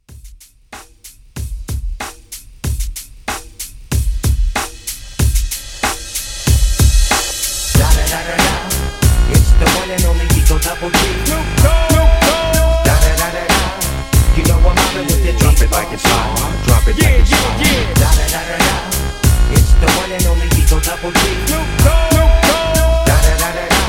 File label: 90s Hiphop Old School Hype